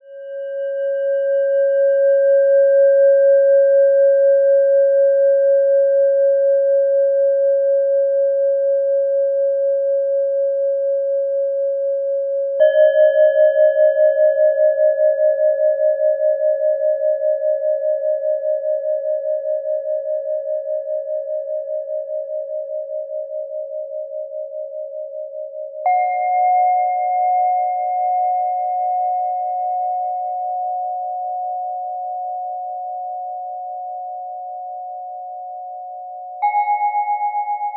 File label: Hybrid 5th Oct Pentatonic Set Strikes